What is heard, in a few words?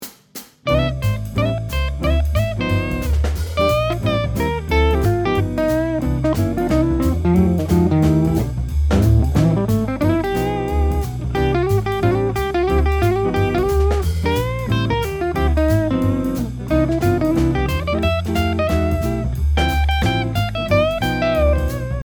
jazz backing solo, guitar